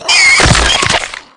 death; garrysmod

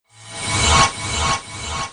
invincibility over; garrysmod

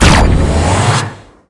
nade shield; garrysmod

